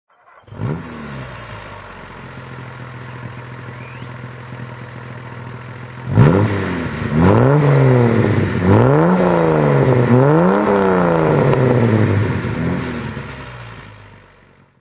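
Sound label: Jaguar XK120 DHC throttle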